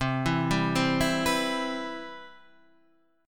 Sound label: C Major 7th, Guitar Half Step Down; CM7